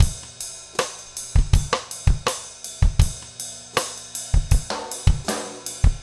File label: trip hop 80 rims